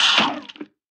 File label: potato spit worm